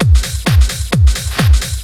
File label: drum 130 straight; Techno Trance Essentials